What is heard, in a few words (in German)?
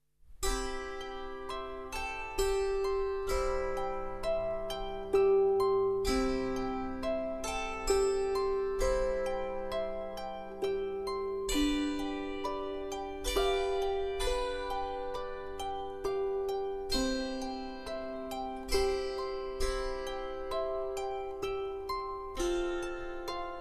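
Stille Nacht, Harfe; weihnacht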